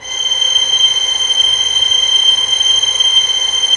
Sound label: STR VLS, STRINGS; Roland - LA Composer vol. 1